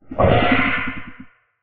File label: damage; Barotrauma; Barotraumatic; Abyssalcrawler